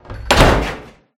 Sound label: metal door hatch close slam; SFX; Environment; MONO; Doors; ProSoundCollection v1 3